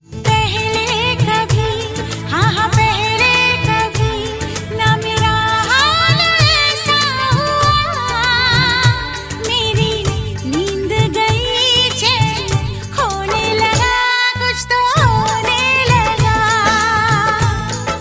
female ringtone; baghaban

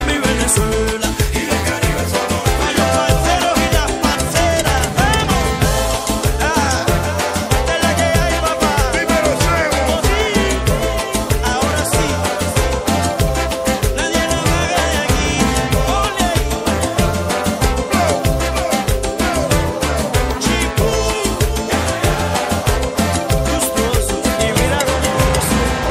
columbia ringtone

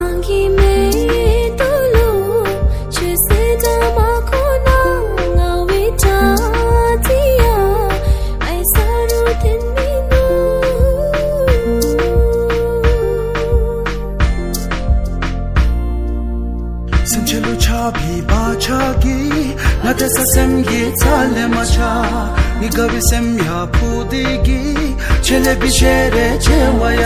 bhutanese song ringtone

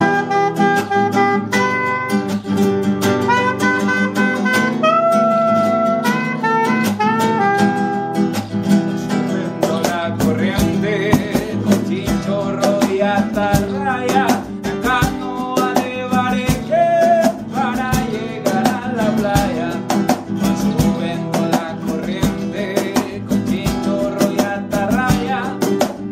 colombian ringtone

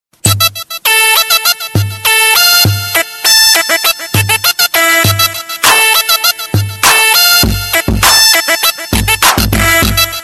hip hop ringtone

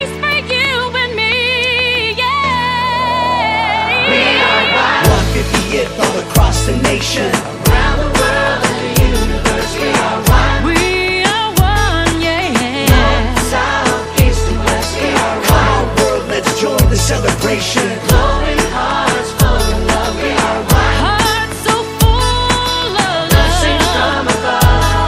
canada song ringtone

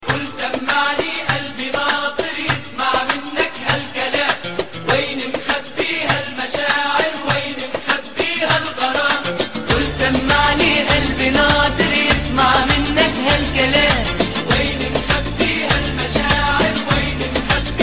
arabic lovely tone

